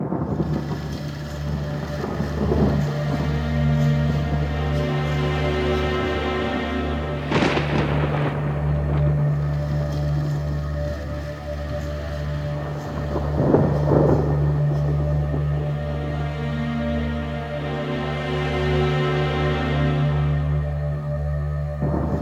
bgm entrance

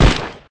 Rifle; MilkChoco

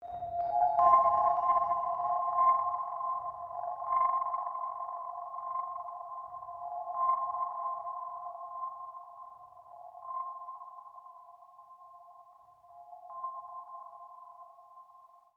BGM Lobby; Metal Mind

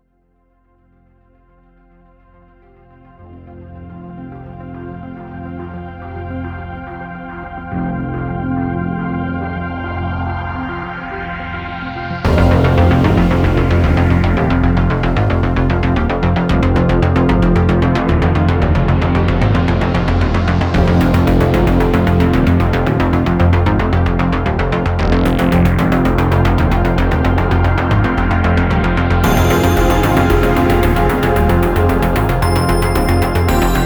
Action No Drums

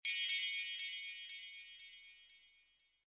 Creative Wave; F.A.M.P.S

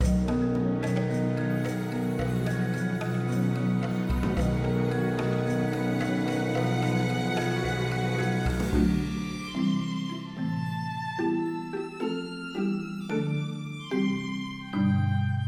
pleasant fountain; pirate mysteries android ios macos mobile windows gamerip 2012

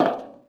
Char Fs Sprint Metal; We Happy Few

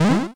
Boing; Blastmorph Pinball; xbox series xs

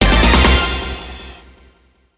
(Jingle) New Record; Go Series 10 Second Run; DSiWare

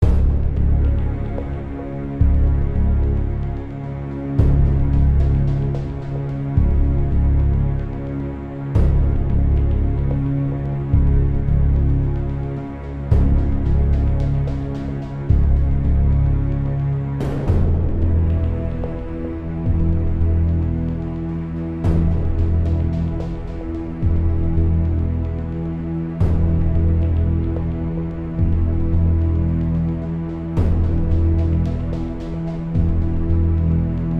Action noArp; Just Action

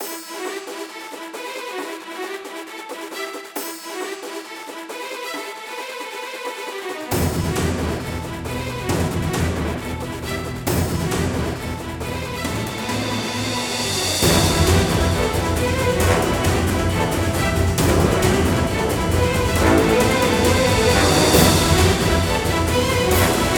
Combat Looped; Dual Blade: Battle of the Female Ninja

